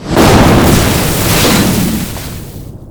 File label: dog car impact; npc